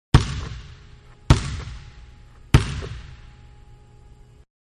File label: Basketball Indoors-Ball Bouncing-3 Times; Manhattan Production Music